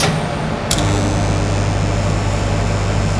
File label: DC Welding